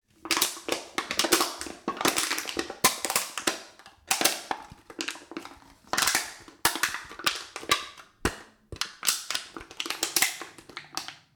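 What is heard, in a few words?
plastic object crumpled and crushed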